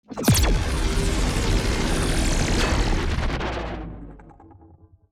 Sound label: Cybernetic shockwave energy blast; Sci-Fi